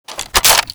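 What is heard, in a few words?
weapon foley m40a3 start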